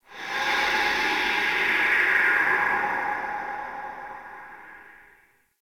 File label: interior cave wind howls; Ambience; Misc Indoor; DE; Warframe; Infested Eris